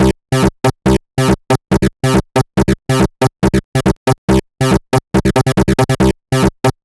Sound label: Arp 140bpm C, Trance; Zero-G Trance Inducer; Arps 140bpm; 90 s Sample CDs